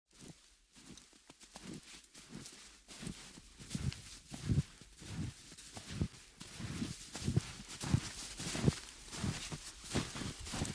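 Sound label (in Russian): подходит по снегу останавливается проходит мимо и удаляется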